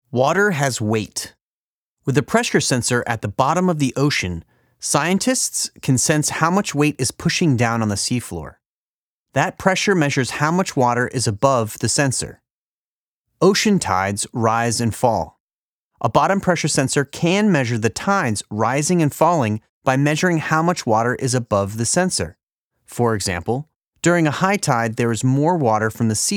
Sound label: Axial Seamount Eruption Audio